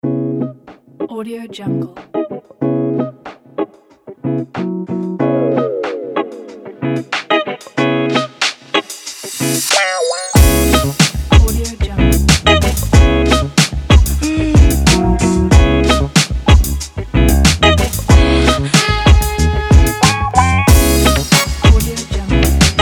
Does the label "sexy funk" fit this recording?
Yes